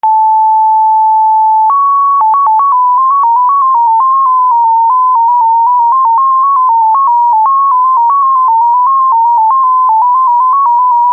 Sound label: mfsk 8